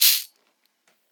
long tail reverb shaker sound c key; percussion

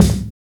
kick one shot g sharp key

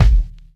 strong bottom end bass drum sample D# key; kick

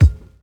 clean kick drum single shot d sharp key

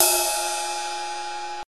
ride sound sample g sharp key; one-shots; cymbal drum

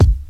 phat bass drum sound c sharp key; kick drum samples

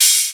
short open high hat sound a sharp key

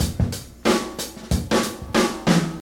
92 bpm drum groove D key

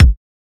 small reverb kick single hit a sharp key; kick drum samples

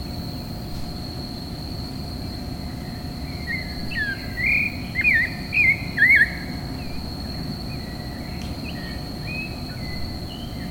clay-colored robin bird song

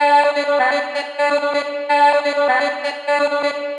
chopped vocals female Dm 127; sound effects

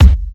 rich mid range kick drum c key

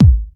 low steel kick drum sound A key; kick drum samples

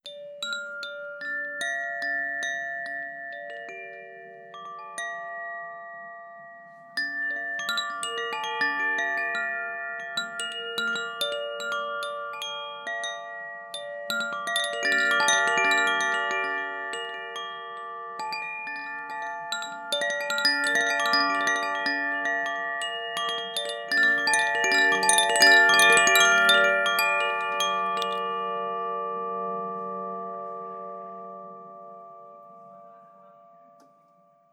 wind chimes; zaphir 5 seasons sounds

